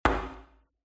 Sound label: drum kick